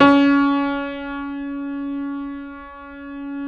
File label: C#3 R, Keyboards Acoustic; USB Soundscan; AKAI